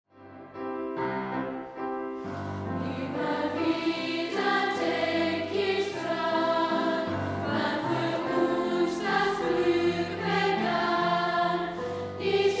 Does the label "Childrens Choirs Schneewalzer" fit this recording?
Yes